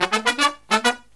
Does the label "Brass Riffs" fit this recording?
Yes